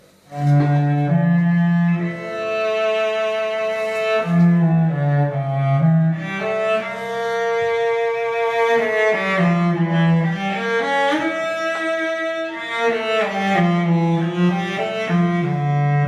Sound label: cello Sound Sample